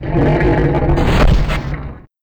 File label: DIE; LockJaw; Mob